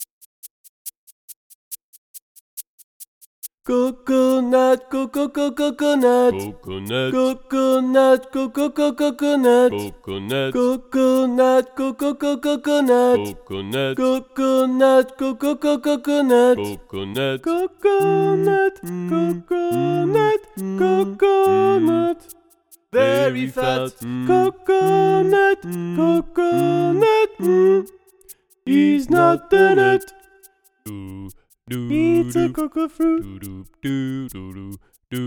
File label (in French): coconut song basse et alto